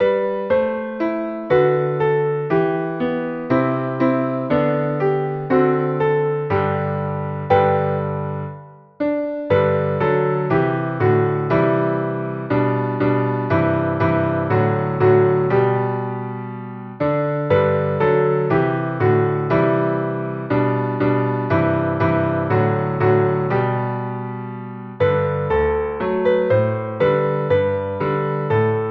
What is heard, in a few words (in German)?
Klavier